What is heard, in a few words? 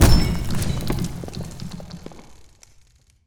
glass impact; Damage; Barotrauma; Subsurface